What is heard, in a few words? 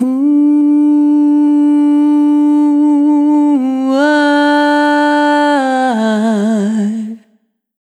Single Voice Melodic Loops; Singles